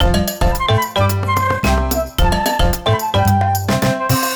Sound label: Latin, ringtones; Nassau; Google; NewWave Labs